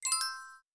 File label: hint answer effect